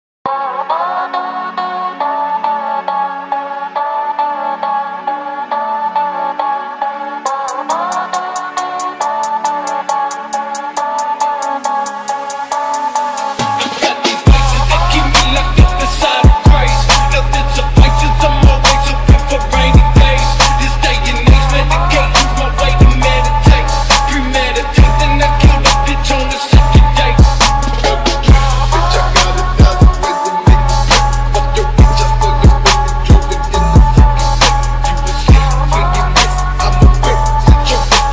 phonk remix; pesnya iz tik tok

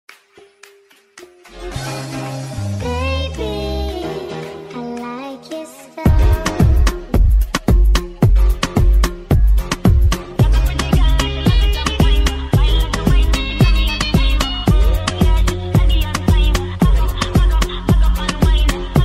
remix speed up

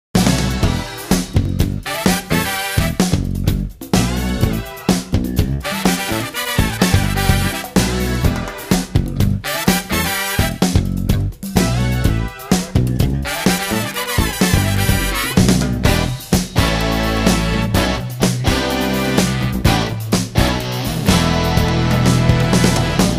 swing

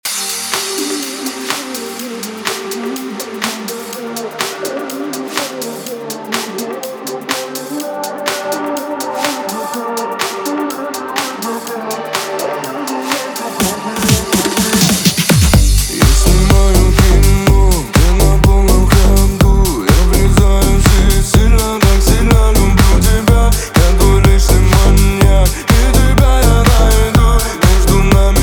radio remix

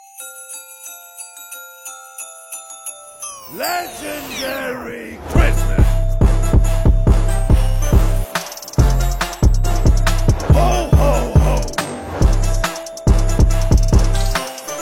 jingle bells remix

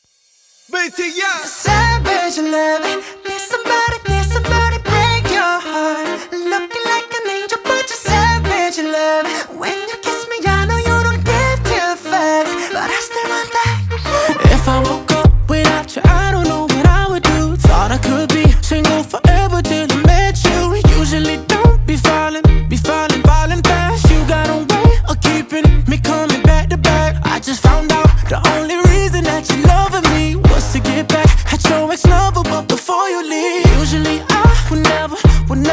siren beat

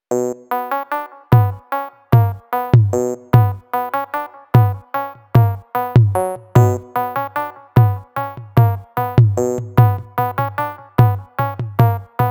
bounce

